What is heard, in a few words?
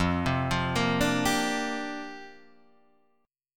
C 7th Suspended 2nd Suspended 4th F